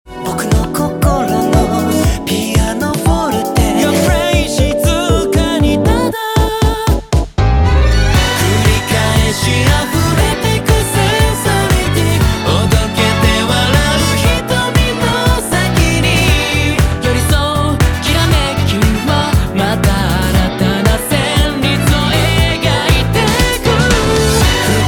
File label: Piano Forte; DISCO